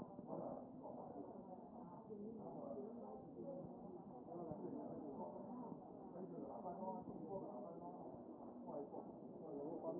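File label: anterior chest, position 1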